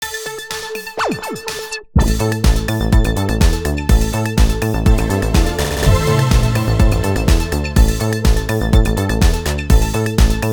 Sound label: Jingle